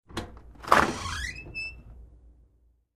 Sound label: open house